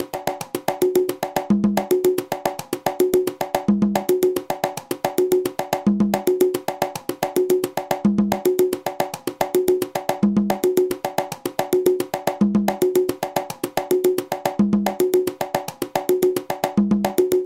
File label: timba conga